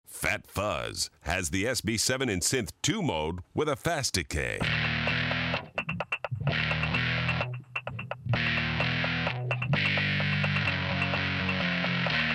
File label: Fat Fuzz